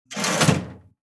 Cash register drawer close

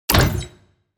Casual mobile game menu ui unlock item

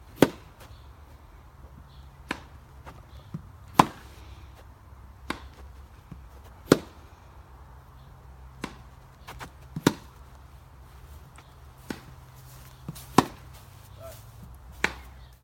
Tennis rally grass court

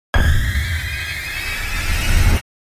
Granular madness impact jumpscare suspense

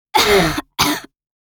Teenage girl coughing heavily

